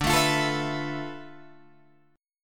D Minor 6th Add 9th D, Guitar, Open D

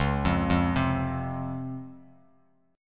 Db Major 7th, Bass Standard; arpeggiated; JGuitar

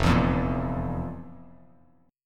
F Major 7th Suspended 4th F, Bass Standard; FM7sus4 strummed; JGuitar

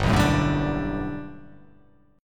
G Major 9th, G, down

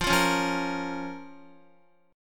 F Suspended 4th Sharp 5th, down, Guitar; Fsus4#5 strummed

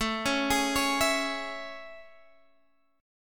A Major 7th A, Guitar, Standard; AM7 arpeggiated; x,12,11,13,14,12; JGuitar

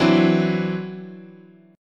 E 7th Suspended 2nd Sharp 5th, chord

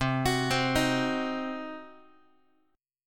C Diminished, Guitar, chord; arpeggiated; JGuitar

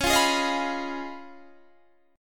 Db 9th Db down Guitar Standard; strummed; JGuitar

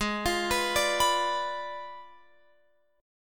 G sharp Diminished 7th G sharp, Guitar Open F; G#dim7 arpeggiated; x,15,17,18,17,18

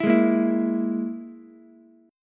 Gsharp Suspended 2nd Sharp 5th, Ukulele; strummed

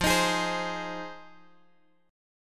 F Minor 7th F Guitar Drop D

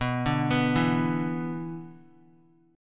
B-Minor Double Flat 5th, Guitar; Bmbb5 arpeggiated; JGuitar

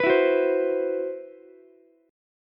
C Major 7th Flat 5th, Ukulele, chord; CM7b5 strummed; JGuitar

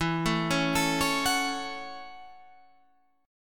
F sharp Minor E, Chord; arpeggiated; JGuitar

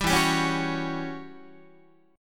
E Minor 6th Add 9th Guitar; Em6add9 strummed; 10,12,10,11,10,12; JGuitar

